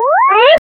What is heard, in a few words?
mario jump